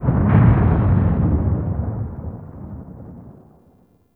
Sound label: Sentient Vessel Step Delay, Enemies; Warframe; Tau; Movement